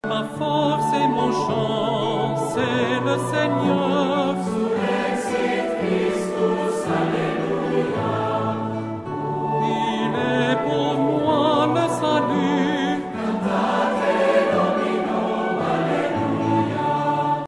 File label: surrexit christus solista